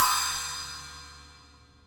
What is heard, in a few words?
ride sound sample b key, Royality free ride cymbal sound sample tuned to the B note